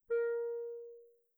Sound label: sfz barrel, clarinet articulation